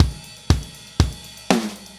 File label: 120 Solid Rock Drums; Pop Rock